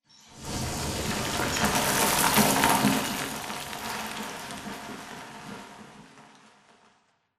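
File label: Explosion Rumble Interior Distant Debris; Boomtown; Titanfall 2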